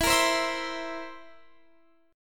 Bb Suspended 2nd Flat 5th

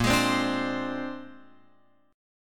A sharp 9th A sharp Guitar Open F; strummed

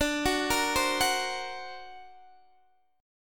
D Augmented 7th D down Guitar DADGAD

